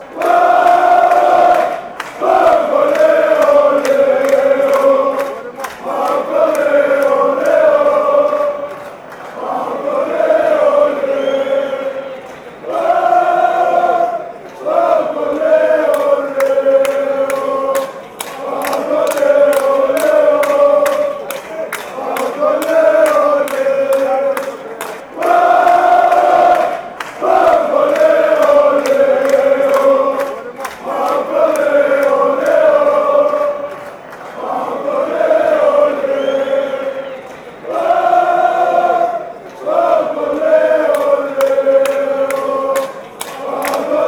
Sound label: chant, fanchants; paok fc